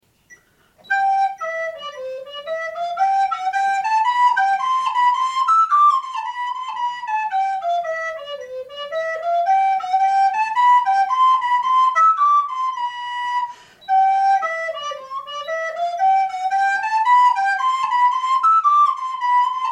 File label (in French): passepied rapide, FLUTE; dieupart